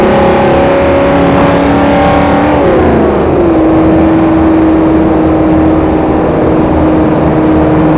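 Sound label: fourth cruise, car engine; tdmcars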